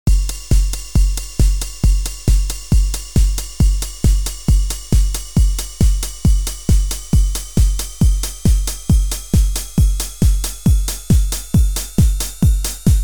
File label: cymbals, 909